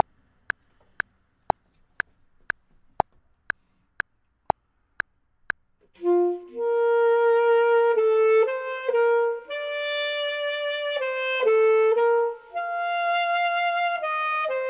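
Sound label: top, Saxophone and Clarinet; voxman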